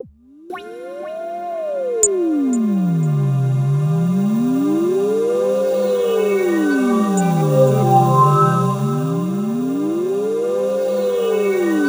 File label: C4, SynthLine